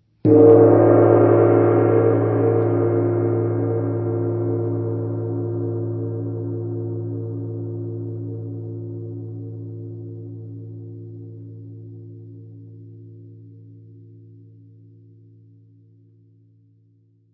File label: gong, Chimes; Chau Gong